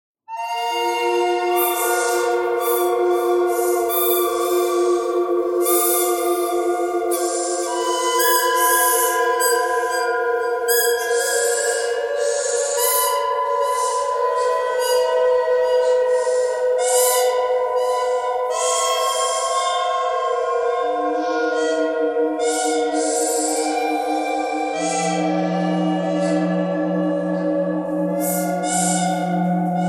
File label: bird canyon bird thread noise all; birds